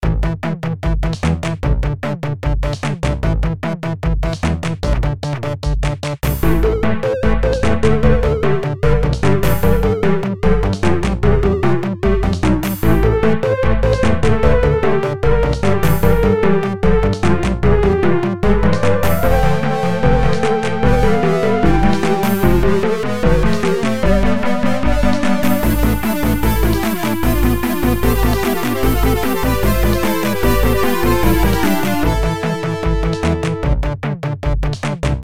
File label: Triangle Riff